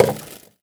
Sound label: Foley